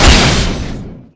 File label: damage